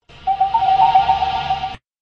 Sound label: sms beeps; ringtones; torchwood